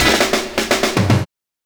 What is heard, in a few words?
FILLS, 70_s Breakbeats